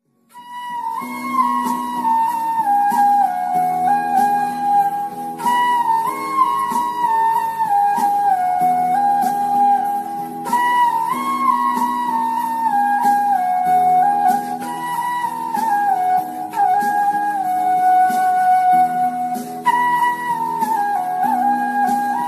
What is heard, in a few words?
flute ringtone, bollywood